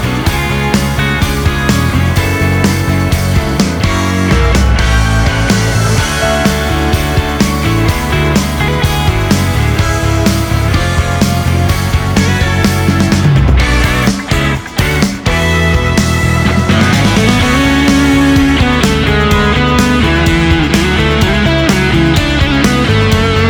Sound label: Instrumental